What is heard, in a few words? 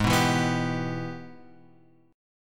G sharp Minor Major 7th Sharp 5th G sharp; G#mM7#5 Chord {4 2 2 4 X 3} strummed